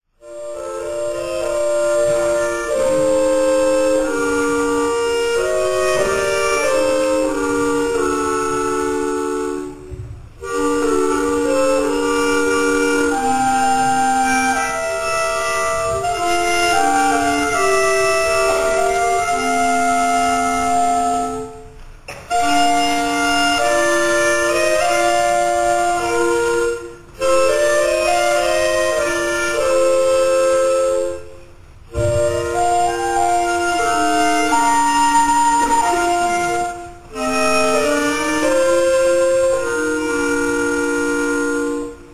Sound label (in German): Klarinetten; Laaber Advent 2008